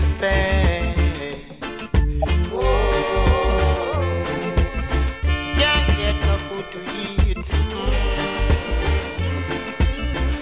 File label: reggae